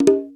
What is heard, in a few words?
ding up